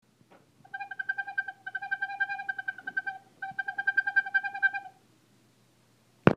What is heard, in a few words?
rail